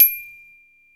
D5 glock; InVision Interactive; Lightware VOL-1; Instruments & Percussions; GLOCKENSPIEL

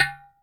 Udo, Percussion, Yamaha DTXpress

